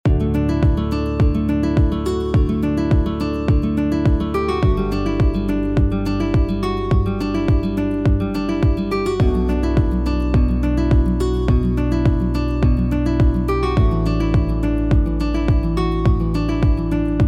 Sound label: Bright